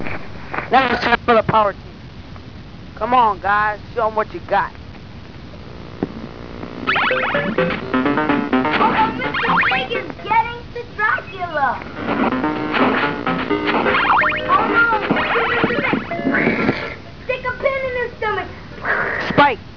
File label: Power Team